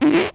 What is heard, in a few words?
fart